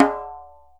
Djembe Na; Multisamples; Digital Sound Factory Planet Earth

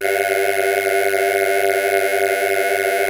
PPG VOX C G3; DIG VOX; Music Store - Claudius Diemeter Schwerlos

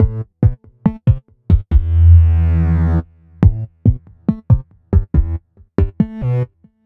Synth Bass

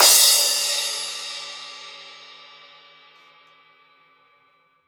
GATE CRSH; USB Soundscan vol.10; AKAI; 90s sample CD; GATEKIT 1

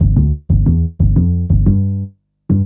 Upright Synth Bass, Drum & Bass; Sonic Foundry; Fluid Dynamics Drum & Bass

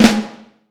5X14 Snare Drum

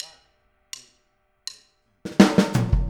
Boogaloo - Full Mix, 82 BPM; Drums; Intro; Sonic Foundry; Drums from the Big Room The Mixes

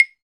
CLAVE; NorthStar - Global Instruments VOL-2; LP

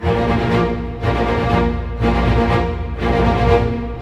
SNAR, Snare Army; East West - Scoring Tools

